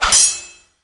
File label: sword swing